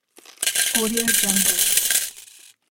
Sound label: money coins falling fast